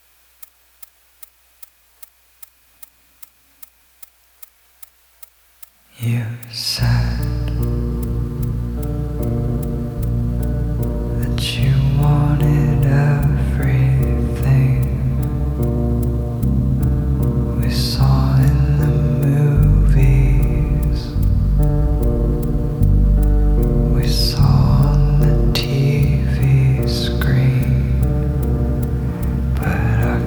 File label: action scene